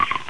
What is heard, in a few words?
steam-button; Professor Fernleaf from the Tropics